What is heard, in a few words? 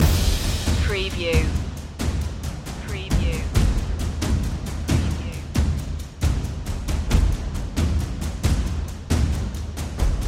glory percussion loop